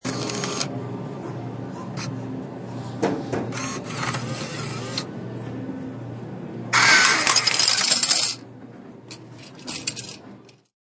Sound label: Chng Mchine, Change machine makes change.; Clanks